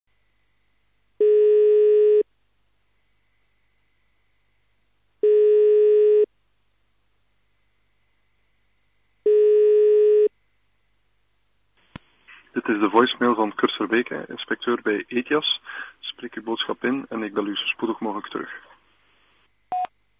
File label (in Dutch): telefoon